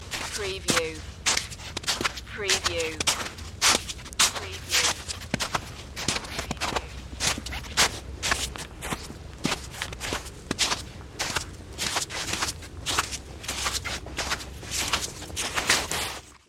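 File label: Footsteps Snow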